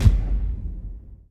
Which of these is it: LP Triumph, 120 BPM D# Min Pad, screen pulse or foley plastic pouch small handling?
screen pulse